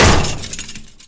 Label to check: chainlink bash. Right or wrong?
Right